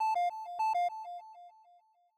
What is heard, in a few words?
shop chime